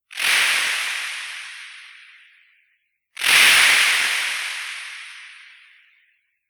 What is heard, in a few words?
Synth Sand Wave